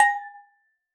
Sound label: tavern